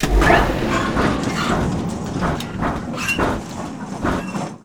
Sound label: Small Blast Door MOVEMENT; gesource; doors